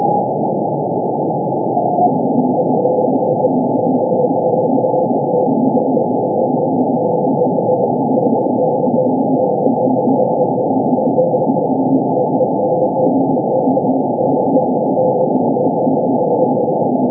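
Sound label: narrow BW; 10ghz; W1FKF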